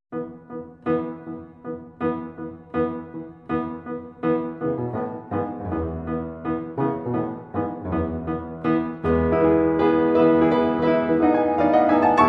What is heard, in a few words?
Mazurka in E major; Op 6 No 3